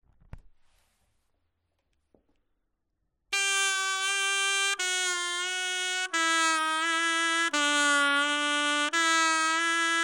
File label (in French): bending